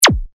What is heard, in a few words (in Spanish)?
disparo; mice